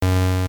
bad roll; Dice World

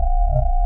Sci-fi Energy Glow Loop; SFX Kit; Sci-Fi Loops

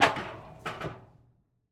Medical Scale On; Blastwave

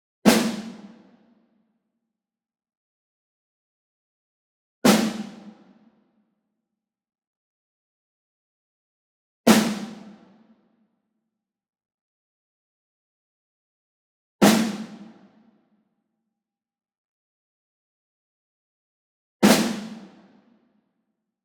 ENSEMBLE SNARE Group Hit Medium; boom library; Cinematic Strikes Construction Kit

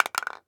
Pill Bottle Set Down; Medical; Blastwave

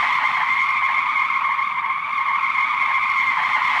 Skid; AudioSpeed

